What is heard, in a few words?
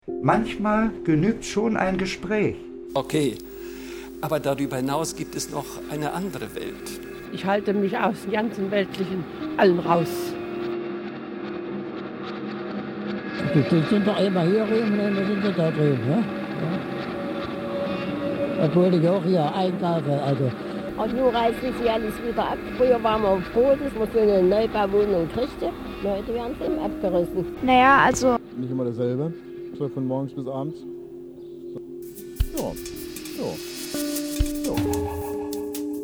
beat transform